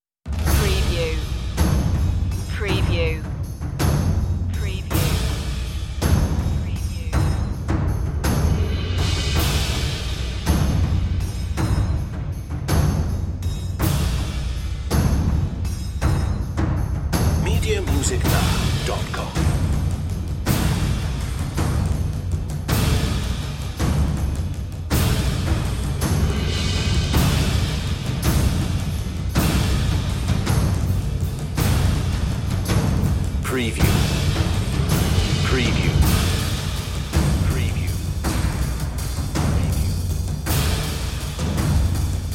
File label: percussion only version